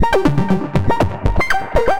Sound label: Hypno Sequence; Ableton; Creative Extensions; Racks